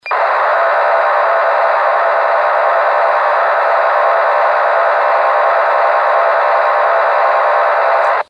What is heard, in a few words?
Yaesu C4FM; ftdx3000d; APP VARI